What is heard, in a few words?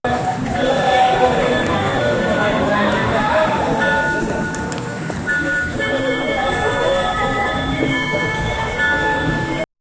indian temple ambience cr